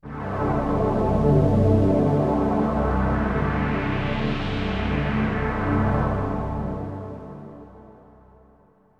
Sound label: SYNTH PAD AMBIENT 160 C, Ambient; eJay; Sphere